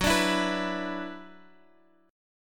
Gb 7th Suspended 4th Sharp 5th Gb down Guitar Drop D